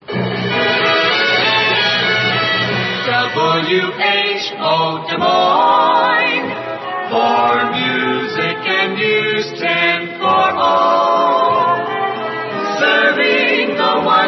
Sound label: dolphin jingles